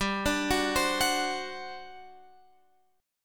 G Major 7th Suspended 4th, Guitar, Open F; GM7sus4; arpeggiated; x,14,14,13,15,13

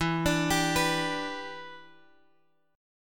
E Minor 7th, down, Guitar, Drop D

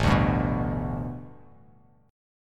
A 9th Suspended 4th, chord; A9sus4; strummed; JGuitar

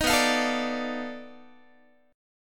B 7th Sharp 9th Flat 5th, down, Guitar; B7#9b5 strummed; JGuitar

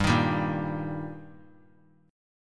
G Minor Sharp 5th G, chord; strummed; JGuitar

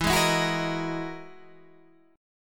E Major Sharp 11th E, Guitar, Drop D; chord shape

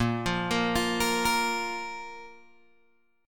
Bb 5th, Guitar, Open G; 8,10,8,10,11,8

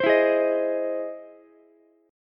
E Augmented Major 7th E down Ukulele; strummed; E+M7; JGuitar

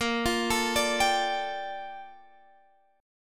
Bb Major 13th Bb down Guitar Half Step Down

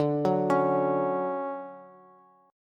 D-Major 7th D down Bass; arpeggiated; JGuitar

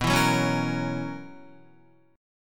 B Major 7th Suspended 2nd Suspended 4th B Guitar; BM7sus2sus4; strummed; JGuitar

